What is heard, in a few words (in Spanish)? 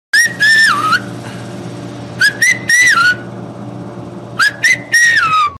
Chiflido